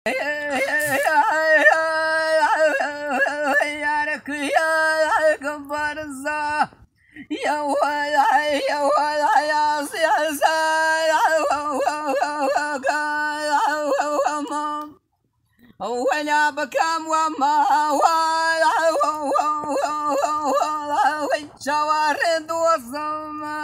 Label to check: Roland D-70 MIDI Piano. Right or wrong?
Wrong